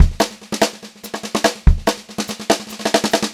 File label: 144 SPEED, Pop-Rock Drumloops